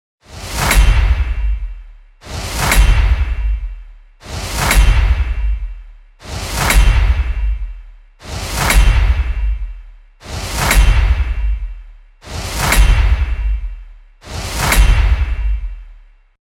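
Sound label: effect of cutting strikes; Звуки обратного отсчета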